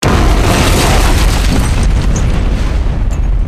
explosion

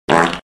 perfect fart sound

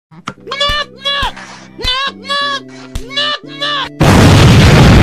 angry noot noot

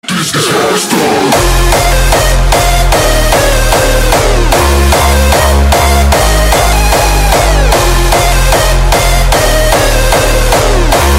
hard edm drop

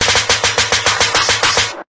snare

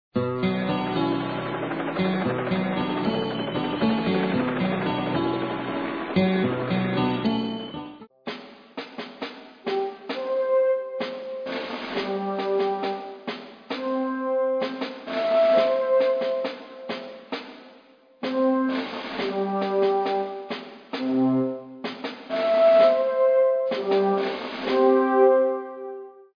cue transition

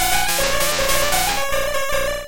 cry; jynx

